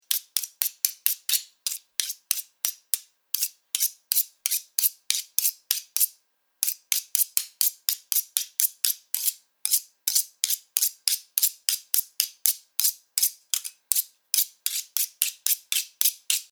Sharpening Knife, Metallic sound of knife being sharpened.; Kitchen; Household